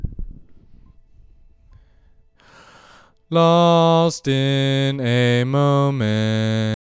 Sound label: Male Ambient Lead Voc